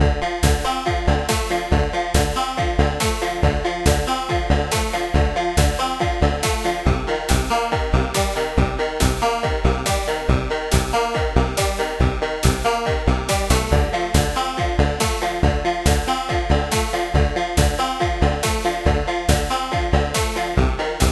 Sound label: rough dungeon; Secrets of Arcadia